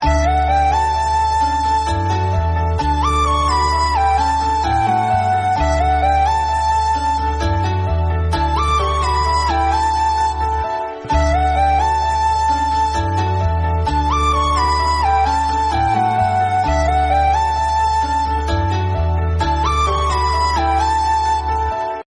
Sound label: Background Music Ringtone; Surya Web Series